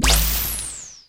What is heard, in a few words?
scuba; Zaps and Beeps